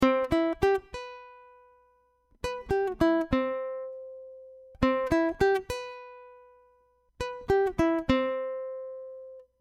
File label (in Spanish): C Escala Back Pause